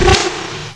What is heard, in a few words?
spit; duke nukem total meltdown